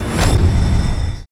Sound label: Scarry effect; Spookslot Tunes Effecten